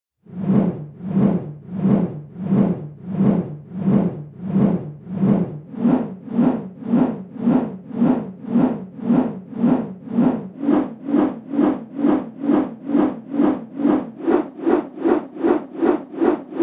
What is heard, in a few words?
Slow Helicopter 3d Version